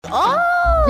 oooh

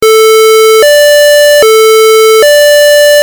fire siren loud

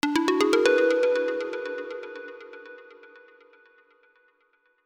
achievement gained

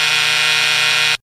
buzzer; tpir

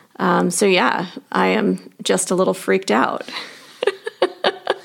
freaked out lol

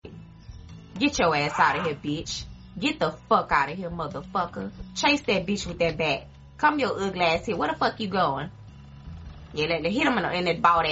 ahh sound

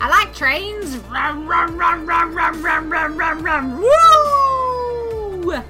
trains

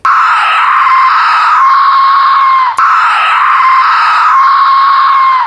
scary death whistle noises

